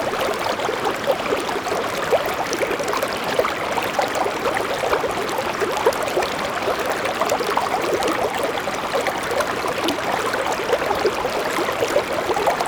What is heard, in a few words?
River, Static Microphone